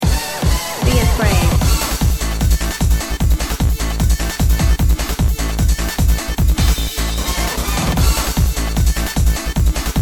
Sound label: HARD TECHNO